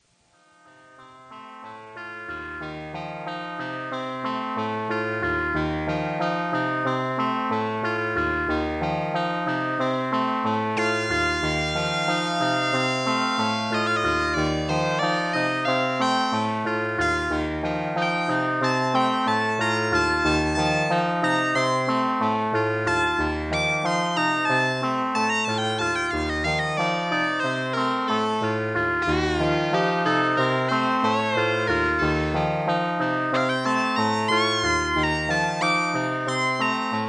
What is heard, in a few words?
Sequence overdubbed; Prophet